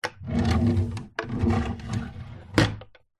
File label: household drawer wood pine open close